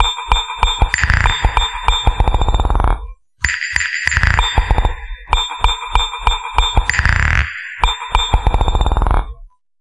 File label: Structured Rhythm, IDM Rhythms